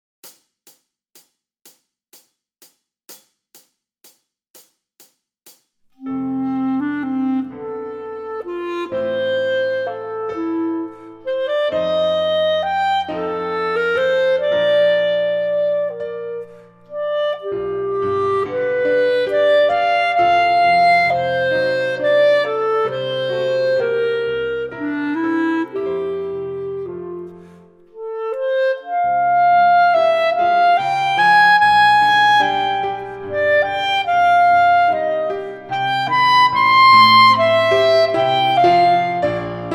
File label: Full Clarinet